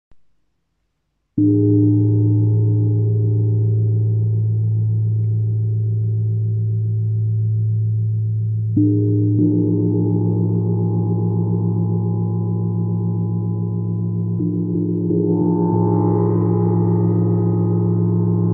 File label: tamtam